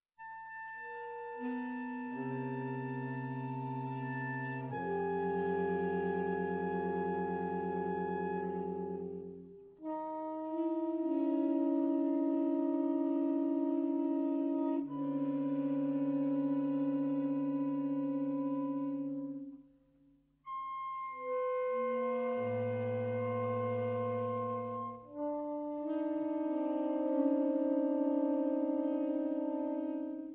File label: Sax